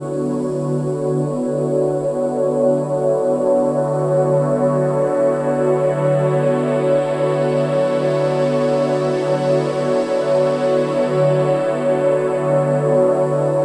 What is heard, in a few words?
pad